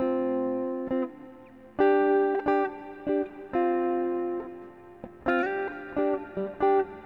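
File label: guitar, D minor, Hip Hop & RnB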